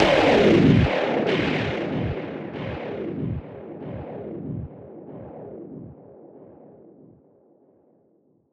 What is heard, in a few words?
Flatout G 142 BPM, Psytrance Zaps & Filter Sweeps; Ghosthack; Psytrance Visions Volume 2